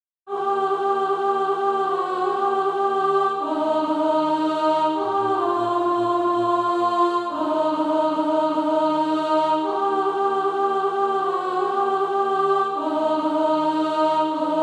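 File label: alt, Practise Music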